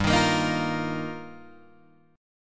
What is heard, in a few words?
G Minor 7th Sharp 5th, Guitar, Drop D